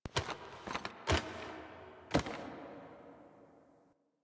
Nursery Foley Get Up; The War Within; Teshin

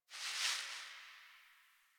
Garbage Plastic Bag Rustle; Warframe; Ambience; Nineteen Ninety Nine; DE

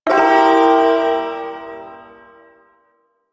Ersatz Shawzin Phrygian Chord

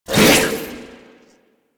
Whip Whoosh; Warframe; Khora

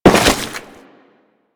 Crewman Step Goo, Footsteps; Warframe; Corpus

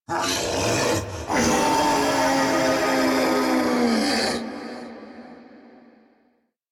Grineer Wolf arrive howl; Sentient; Warframe

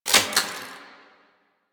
Omicrus Mech; Warframe; Quests; The New War; Weapons

